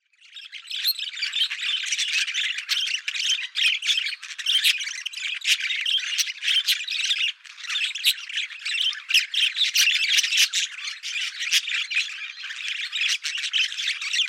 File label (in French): Perruche ondulee MELOPSITTACUS UNDULATUS; oiseaux exotiques